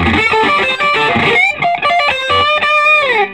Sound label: SOLO, Vintage Blues Guitar; USB Soundscan vol.22